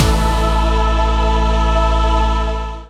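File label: synthesizer Dance; Best Service Gigapack 1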